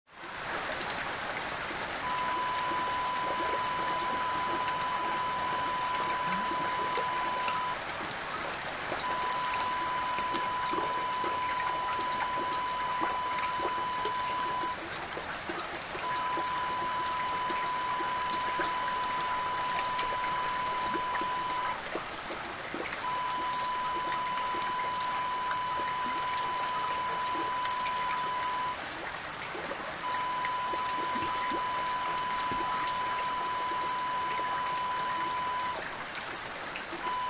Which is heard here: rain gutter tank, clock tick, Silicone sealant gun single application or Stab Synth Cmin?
rain gutter tank